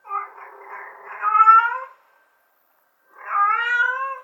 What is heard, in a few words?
Mother cat calling for her kittens; صدای گربه